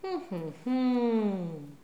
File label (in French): hum professoral